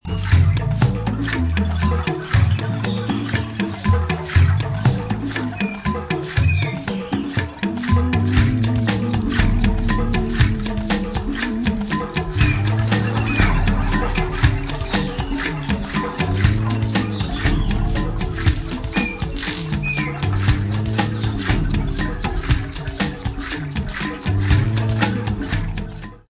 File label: fireants, jungle drums